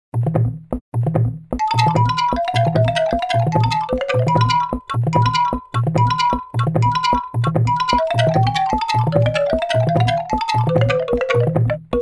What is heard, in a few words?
loop